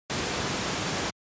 Pink Noise

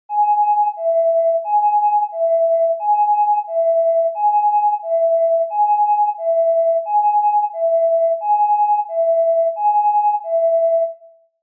Ambulance Siren Close

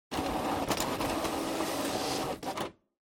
Printer Cancel

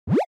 Motion Pop

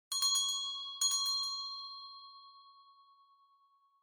Winning Bell Gentle

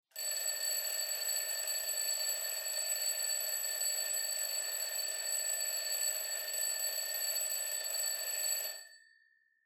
Clock Alarm (Far Mid)

